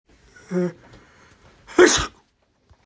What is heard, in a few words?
sneeze